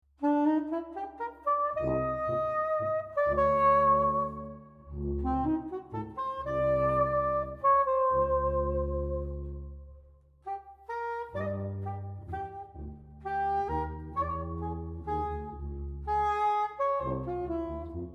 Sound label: Waltz